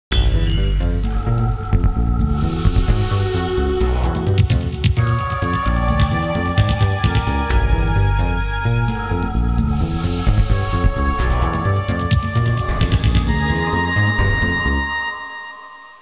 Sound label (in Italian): musica elettronica